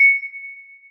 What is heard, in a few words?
celesta, programmable speaker